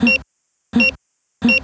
normal beep; Boxy; futuramaworldsoftomorrow

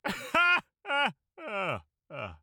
Laugh; Khan; Paladins

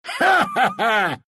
laugh, ru

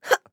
Grunt Jump; Realm Royale; Mage; Radon Flux

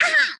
Grunt Hit; Rider; Paladins

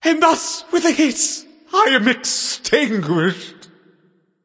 Death; Smite; Theater Agni

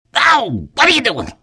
pain

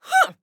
Mage Grunt Physical; Realm Royale; Radon Flux

